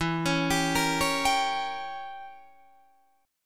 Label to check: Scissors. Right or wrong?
Wrong